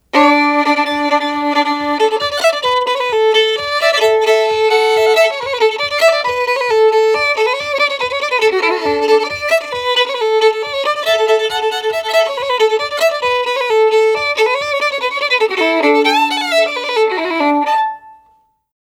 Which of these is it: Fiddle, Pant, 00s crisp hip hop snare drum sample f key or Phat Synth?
Fiddle